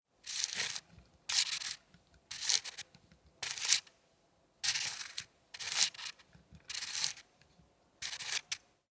faltbare Treckingstoecke Stockgelenk drehen

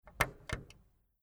Fernsehgerät Philips Discoverer Volume lauter

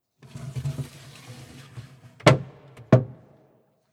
Sideboard Getränkehalter

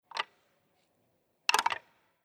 Kinder-Gedeck kleiner Loeffel raus rein

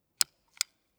Highscreen Joystick Knopf unten

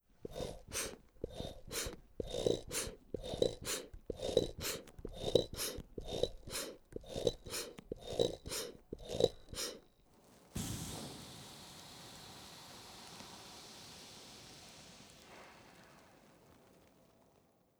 Blutdruckmessgeraet Pumpen Luft raus schnell

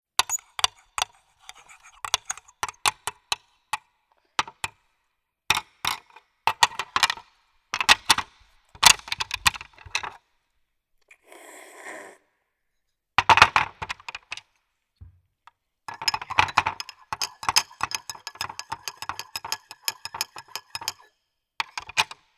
Kinder-Gedeck spielen

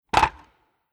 Kinder-Gedeck abstellen

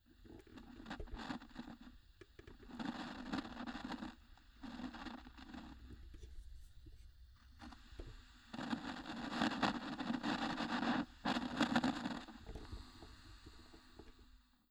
Portables Radiogeraet TS502 MF Frequenzsuche Knistern

